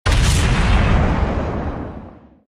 battle boom

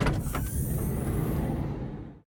battle torpedo move

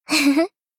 laugh

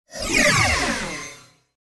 battle raser

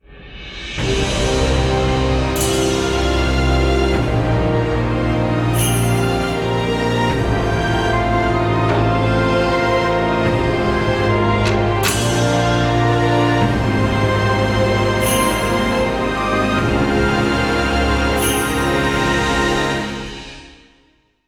bgm logo; amagi

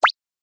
minigame boom; ui; ryza

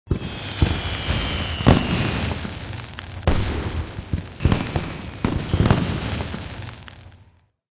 battle firework

